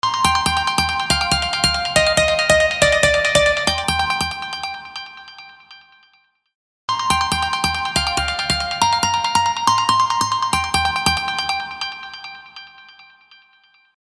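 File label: Hard Delay, ringtones; Vivo X5 Pro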